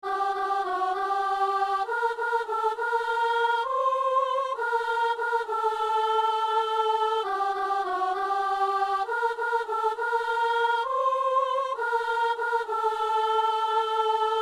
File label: soprano; Messe de San Lorenzo - Alleluia